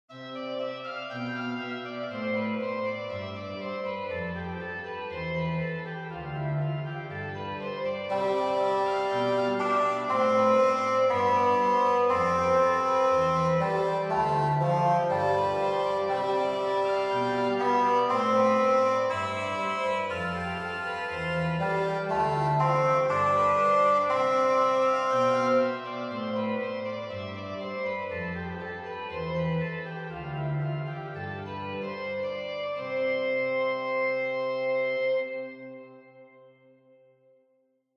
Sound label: OrganTeq Reeds